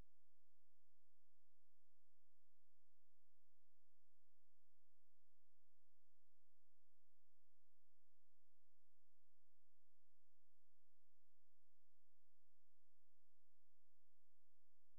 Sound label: pink noise Inversed (-3dB Oct); AK240